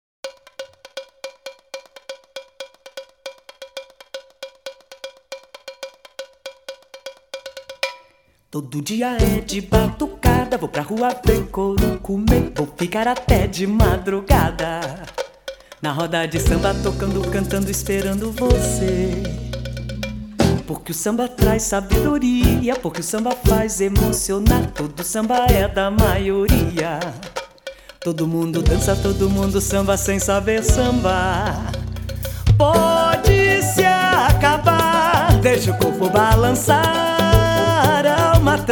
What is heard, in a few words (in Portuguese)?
Samba